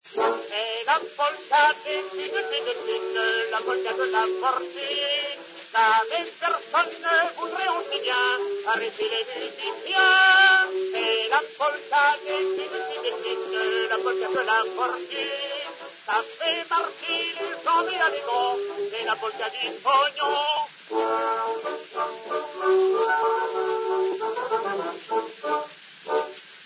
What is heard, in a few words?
polka; thunes